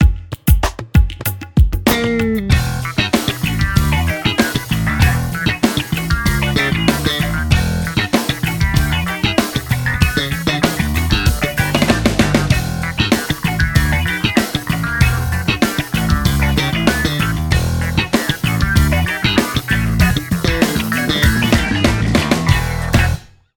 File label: Fail Jingle; Gran Turismo Sport; Transmission Mode; Christmas Extra